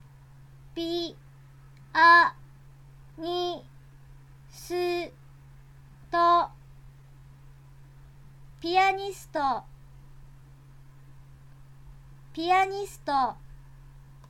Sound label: pianist